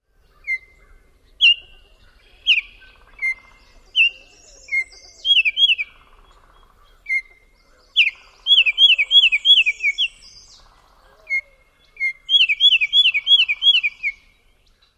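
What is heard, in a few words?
song and whistles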